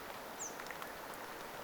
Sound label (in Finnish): ilmeinen töyhtötiainen